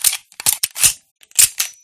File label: m93r reload, guns